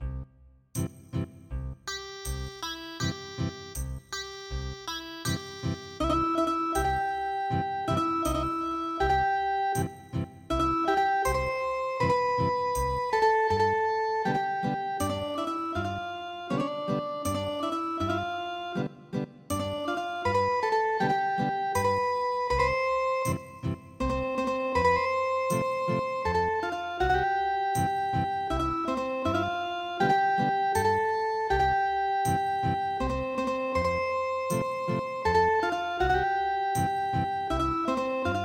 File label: Wiegenlied Ethnic Guitar, midi traditional